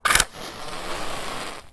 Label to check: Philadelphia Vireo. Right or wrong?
Wrong